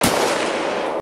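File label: kick; 少女终末旅行; 소녀 종말 여행 음원팩 (FL); Syaro Sample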